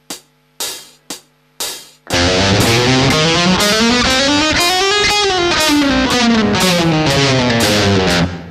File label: leg